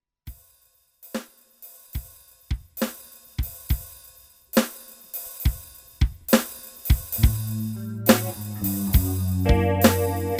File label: slow blues; So sad